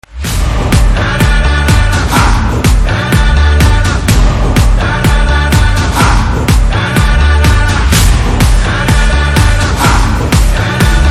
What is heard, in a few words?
BGM Ringtone